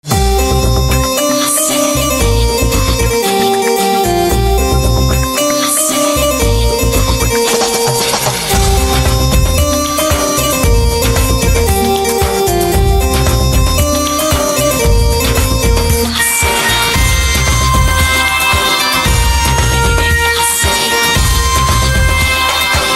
Santoor Instrumental Ringtone

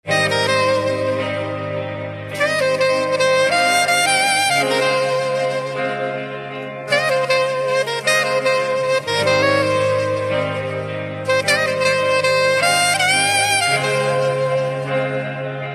Saxophone Instrumental Ringtone; english instrumental ringtone

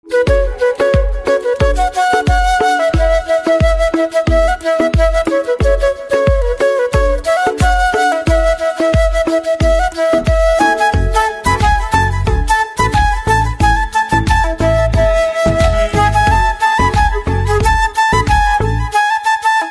Flute

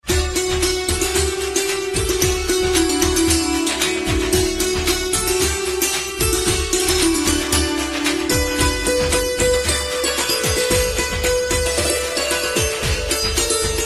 Santoor Instrumental Ringtone; devotional instrumental ringtone

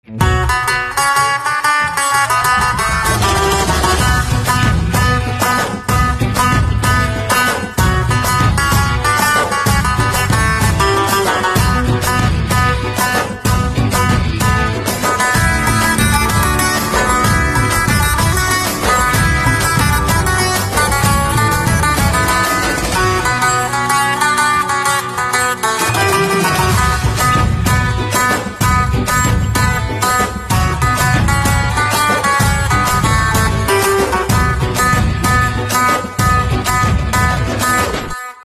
Sitar

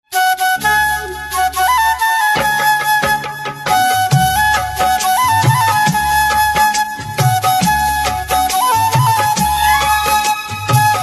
Flute Instrumental Ringtone

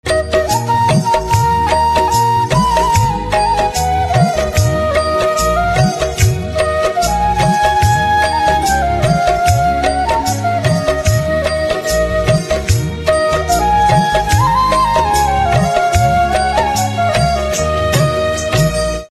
Flute Instrumental Ringtone; devotional instrumental ringtone

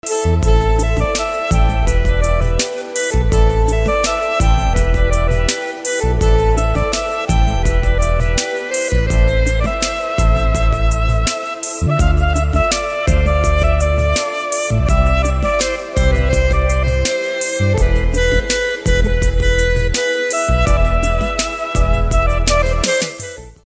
Saxophone Instrumental Ringtone

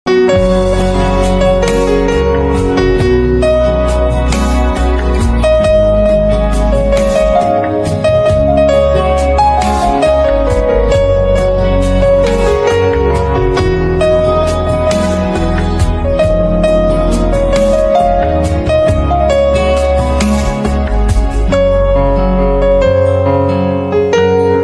Piano Instrumental

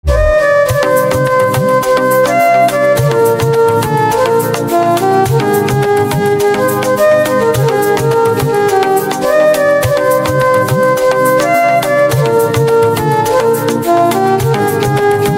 Organ Instrumental Ringtone

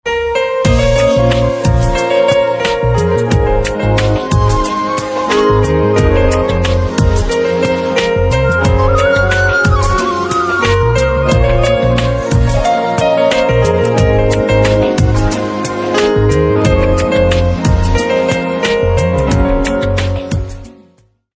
Piano Instrumental Ringtone

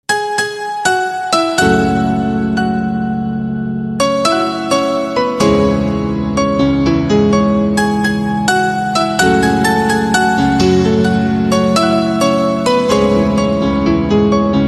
Piano Instrumental Ringtone

